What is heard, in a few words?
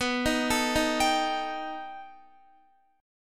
B Augmented 7th B down Guitar; B+7; arpeggiated; JGuitar